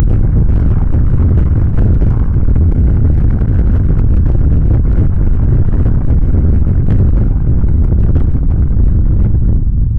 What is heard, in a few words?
rocket engine burn in spa; space